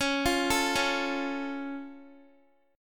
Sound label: Db Augmented, down, Guitar; arpeggiated